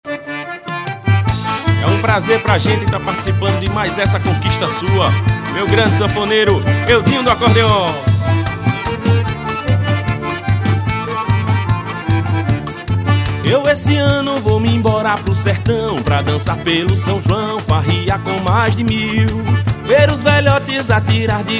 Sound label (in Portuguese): acordeon